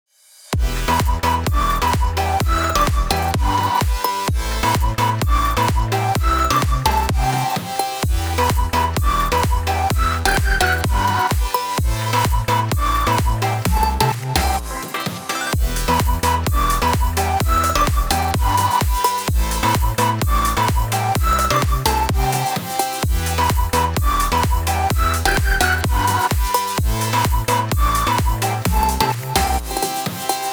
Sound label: Future Bounce Drop Pre Saturation